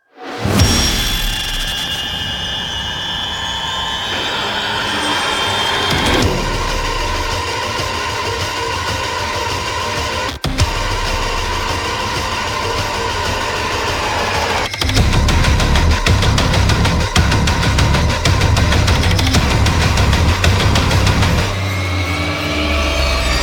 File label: heavy; Doom